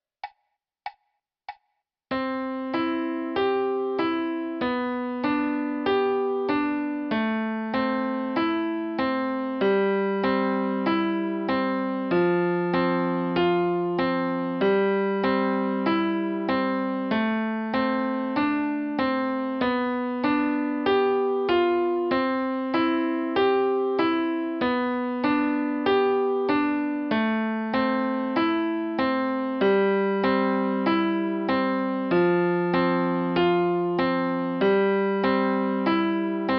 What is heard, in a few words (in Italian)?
Arpeggiando ascolto 5 note